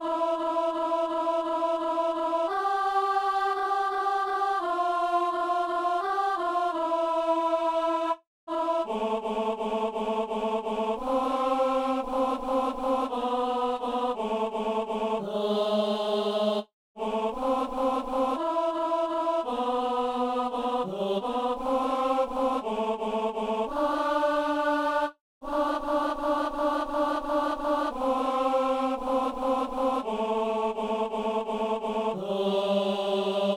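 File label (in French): spéciale pour ténor dame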